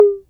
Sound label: SYNCUSSION; Drums